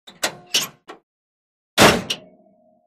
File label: Car Hood Open / Close; Open Small Car Hood With Shrill; Dry Squeak, Slam Shut With Rattle And Spring Oscillat